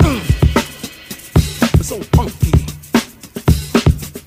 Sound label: so funky, Drum loops